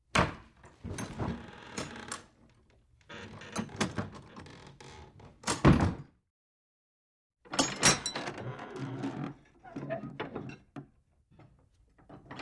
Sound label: wooden door opens and closes with creak